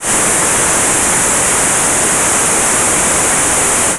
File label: Noise Fricative F